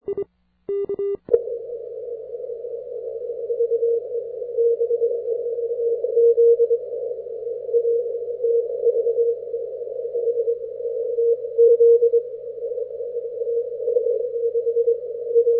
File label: 80m cw; Hans was also coming through well.; DJ7MI